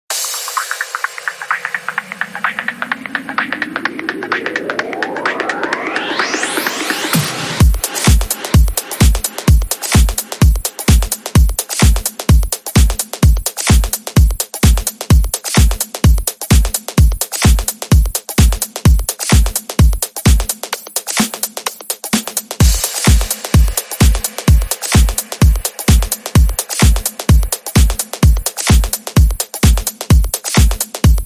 Beat

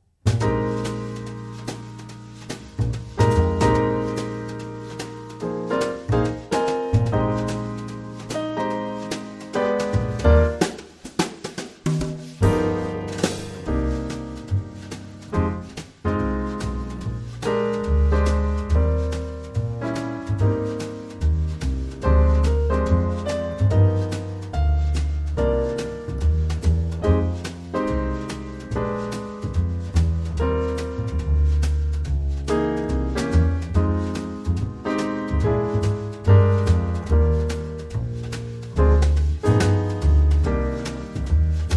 Moonlight Jazz

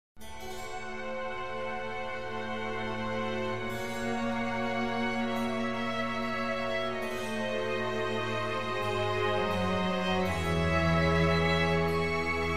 Concerto for Strings in D minor; RV129 Madrigalesco; Antonio Vivaldi

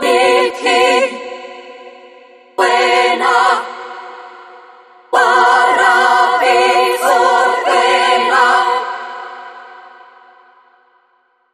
Choir

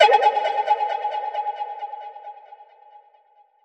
Eccentric Echoes Flute Ping

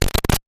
Quick Static Pop

